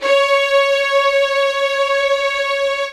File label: LD2 SUS C#5, LOUD VIOLINS; Q Up Arts; Denny Jaeger Master Violin Library